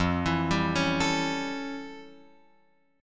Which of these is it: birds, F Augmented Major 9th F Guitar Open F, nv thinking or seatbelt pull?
F Augmented Major 9th F Guitar Open F